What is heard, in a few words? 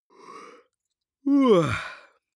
male yawn vocal; koerpergeraeusche; menschen; soundpool